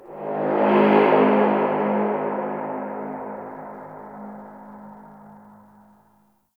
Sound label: Temple Far Horns; Ambience; Corpus Ship; Temple One